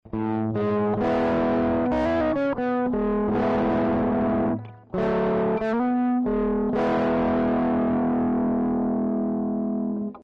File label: two tone + facial fuzz